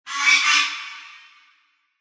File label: Reverb Hit Twice; Scifi Production Elements; Scifi Production Elements, Set 1; LynneMusic